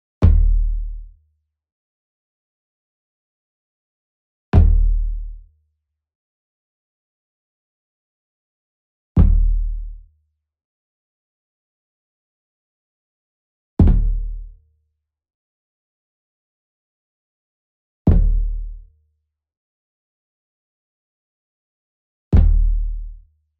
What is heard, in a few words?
SMALL DRUM Fontonfrom Flam Soft Beater Near; boom library; Cinematic Strikes Construction Kit